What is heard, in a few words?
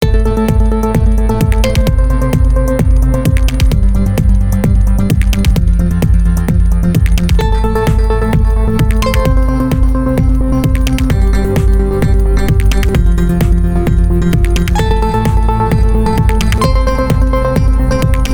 Awesome Background Melody Music